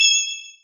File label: Ingame Score Count Plus